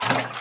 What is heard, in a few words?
throwable slosh; breadmonster